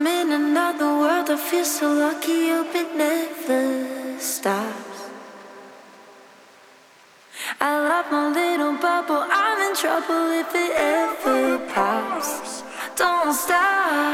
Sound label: LEAD